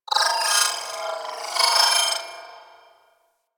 Ship Data Chatter; Ambience; Warframe; Corpus Ship Remaster

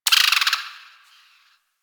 Forest Distant Medium Creature Tree Small Call; Warframe; Grineer Forest

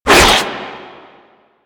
Proto Infested Runner Arm Attack; Warframe; Abilities

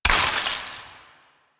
Ammo Pickup Viral; DE; Warframe; Warframes; Frumentarius; AmmoAbility

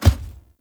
Heavy Grunt Boot Walk Wood; Characters; Enemy; Footsteps; Sinecure

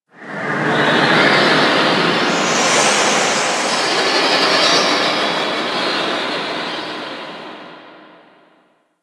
Moon Space Wind, Ambience; Warframe; Orokin

